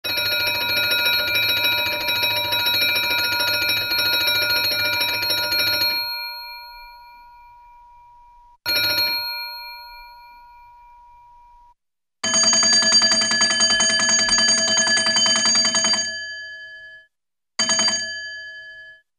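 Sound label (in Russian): zvonok starinnogo telefona, old telephone ringing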